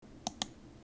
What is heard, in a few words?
click